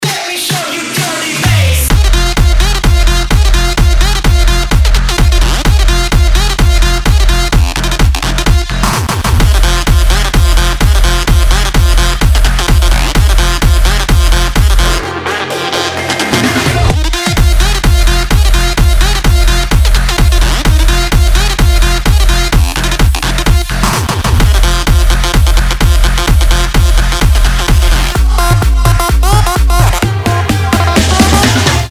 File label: dirty bass